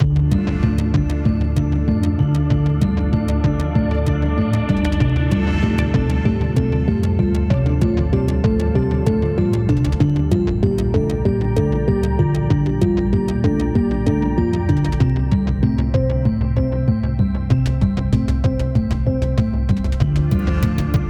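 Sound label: Music Electronic, Electronic Loading 96bpm; Forza Motorsport 6 Apex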